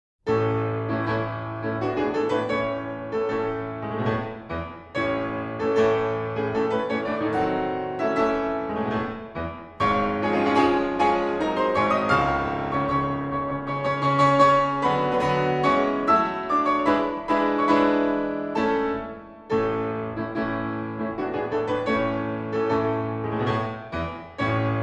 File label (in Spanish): Sonido de piano; Instrumentos de teclado